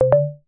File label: incoming sound